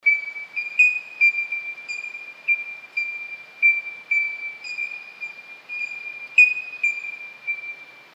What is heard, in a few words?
Bell Bird pitch down; ringtones